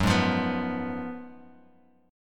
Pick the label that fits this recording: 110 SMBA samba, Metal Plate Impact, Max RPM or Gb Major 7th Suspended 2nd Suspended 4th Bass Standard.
Gb Major 7th Suspended 2nd Suspended 4th Bass Standard